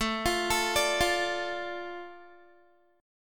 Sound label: A Suspended 4th Sharp 5th down Guitar; Asus4#5; arpeggiated